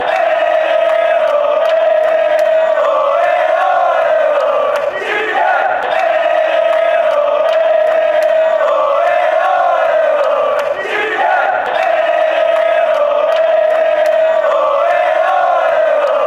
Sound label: eh oh chile fanchants